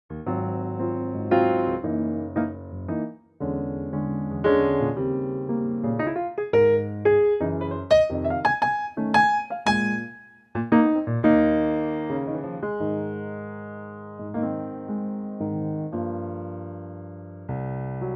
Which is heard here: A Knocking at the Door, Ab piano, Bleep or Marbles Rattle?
Ab piano